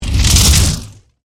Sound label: Fireball Throw, Fire, Super, Hero; Flames; Sound Design; BlastWave